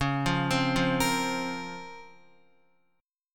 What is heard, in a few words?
Db Major 13th, Guitar; arpeggiated; JGuitar